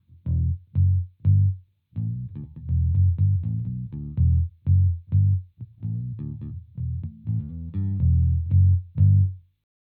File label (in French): mediator no tone, bass; fender bass roadworn